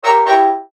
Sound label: Alarm Tonal Orchestral; Musical Element Notification Synth Stabs; Multimedia; Blastwave